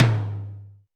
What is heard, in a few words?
Tom Amb Md Clear, Alesis D4